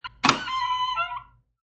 Door is clicked open and creaks; Creaky Door; Creaky Doors

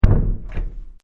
Door Close; Doors General; Miscellaneous Doors; Partners In Rhyme

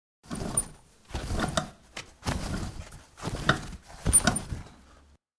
Toilet Roll, Pulling paper off toilet roll.; Bathroom

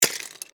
Legos Drop Box, Single, Piece, Plastic, Toys; Foley; BlastWave

